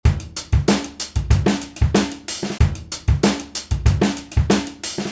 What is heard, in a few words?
break org; Arcade